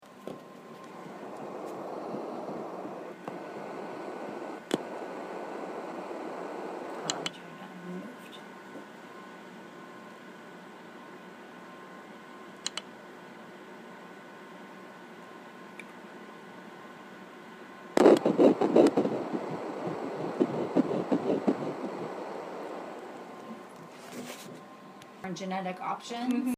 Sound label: Baby beat